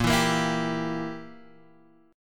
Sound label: E Diminished A sharp